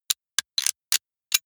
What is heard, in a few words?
sci-fi handling click; comedy-cartoon; pistolen-gewehre